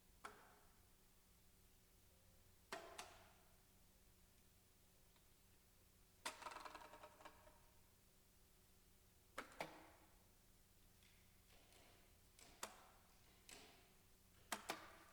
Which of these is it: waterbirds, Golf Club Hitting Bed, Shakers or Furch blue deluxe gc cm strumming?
waterbirds